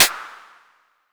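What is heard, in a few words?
vermona drm1 mk3 snare drum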